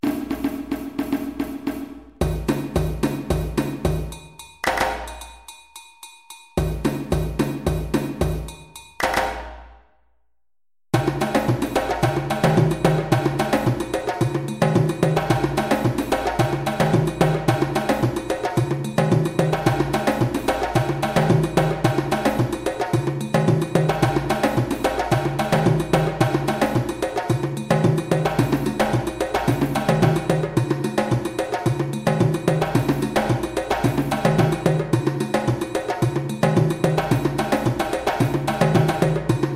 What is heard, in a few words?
Rumba Arrangement 220 bpm, djembe